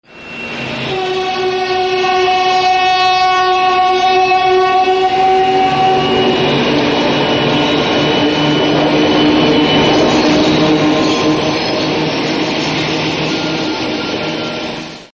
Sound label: train passing with horn